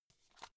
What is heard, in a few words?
watch case small open quick